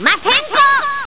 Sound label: maximize; dragon ball z